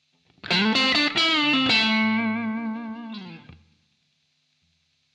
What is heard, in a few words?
string bends